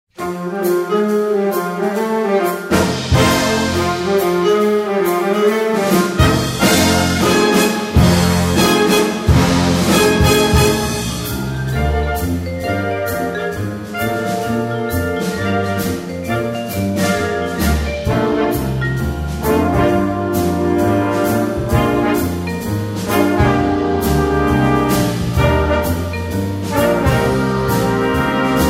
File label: Swing